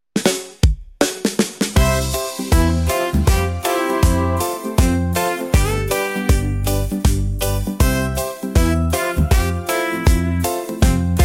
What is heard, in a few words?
theme reggae b